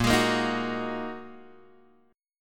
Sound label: A sharp 7th Suspended 4th Sharp 5th down Guitar Open G